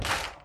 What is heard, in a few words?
Clap